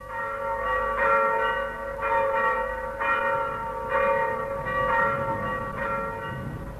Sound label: Church bells